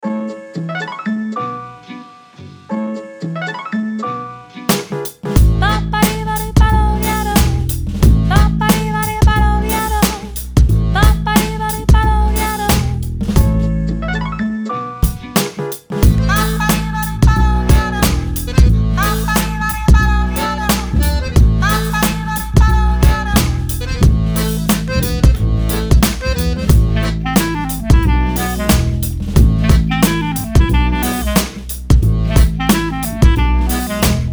Retro Ballroom

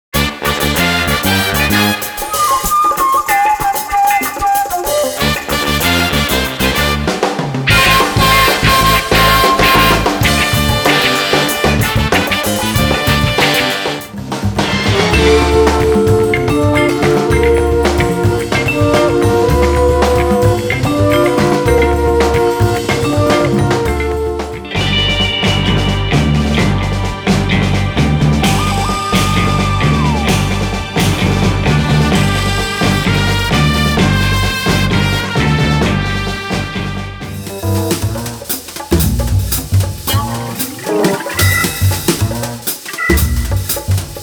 retro cool

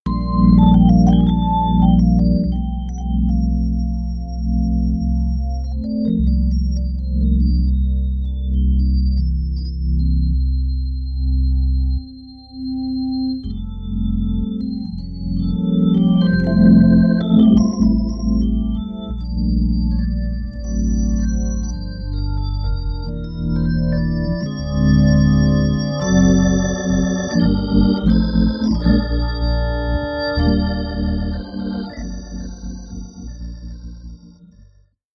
slow organ wet

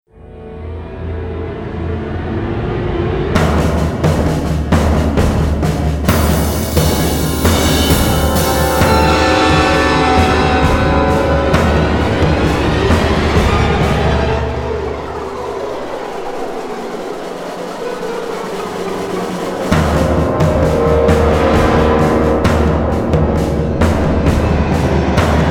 tension

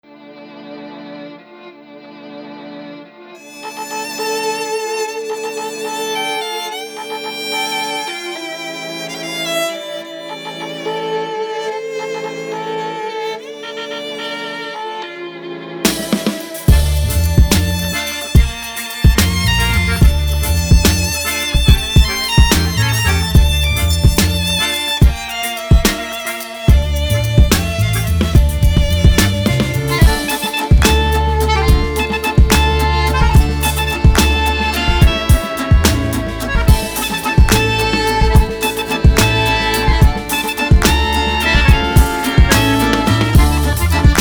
Underground Valse

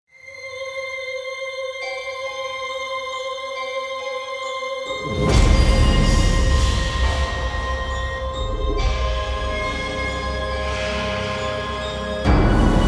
Horror

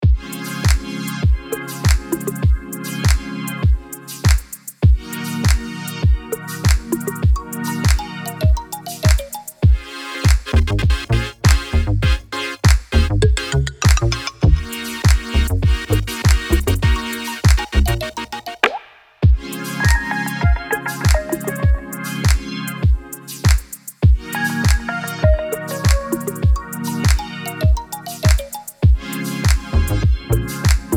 soulful house active

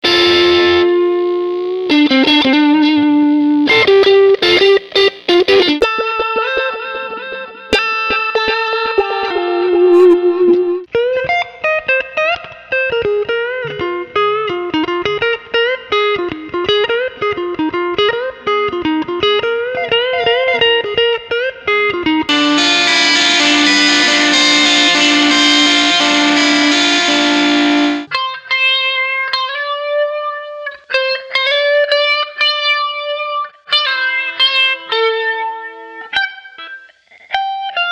Guitar; Guitar Legend

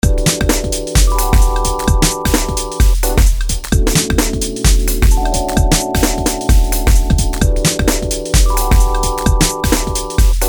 jazz

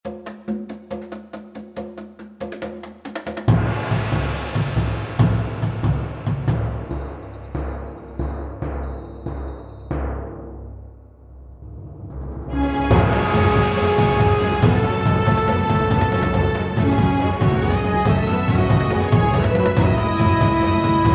Action Cue

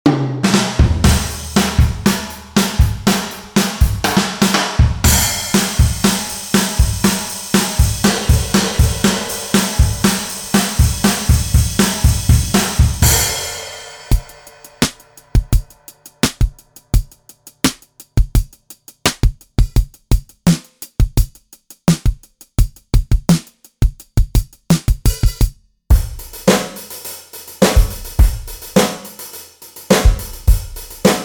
Six Classic Vintage Kits

